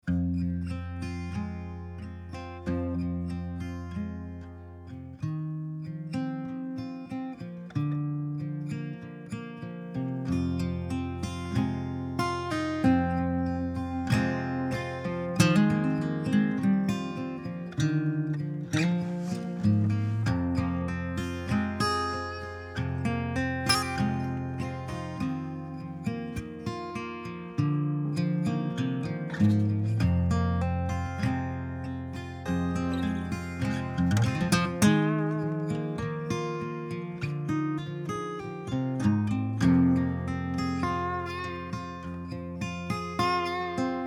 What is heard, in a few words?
Instrumental Song